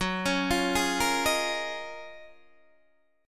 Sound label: F sharp Major 13th Guitar DADGAD